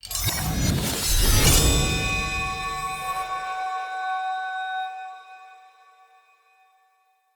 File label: UI Crafting Claim